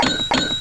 bell ring, percussion instruments; Sound Effects for Multimedia - Volume 1

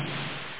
snare, perc

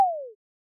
ele Plink

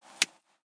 pick pomegranates; Immortal

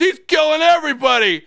see death; Messiah

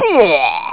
BLEAH

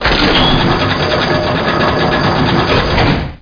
bolt slide

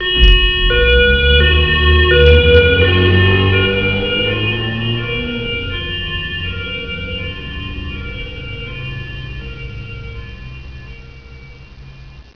Nee Naa Car; Foul Play™ Demo; Games Demos

